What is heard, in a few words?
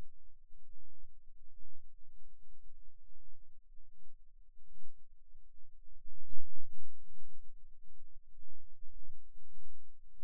Rhythm Wave, eeg seizures